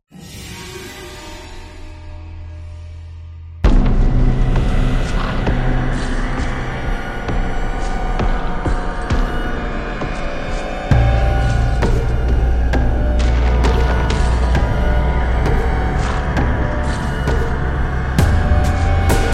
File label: Suspense Horror